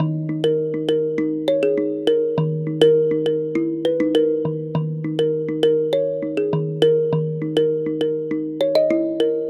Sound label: Mbira; Melodic; Ambient-Melodics-II